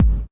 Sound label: house Kick; STYLE KITS; Choosy House Kit